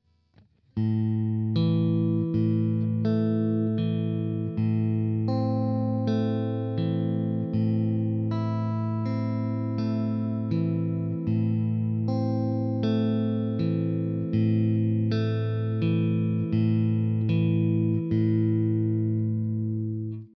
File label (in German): Zupfmuster, gitarre